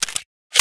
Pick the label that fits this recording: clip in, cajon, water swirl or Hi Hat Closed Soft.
clip in